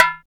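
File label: percussion tabla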